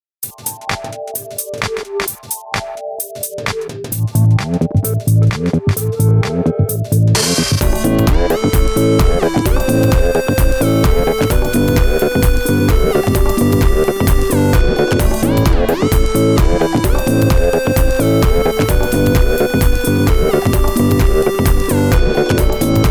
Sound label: Musing Bass lines; Library Music